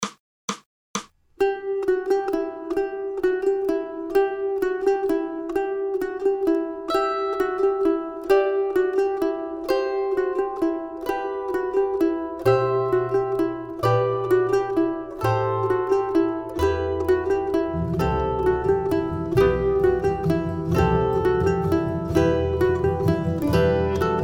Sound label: Carol of the Bells 130 Mandolin 1 2 and 3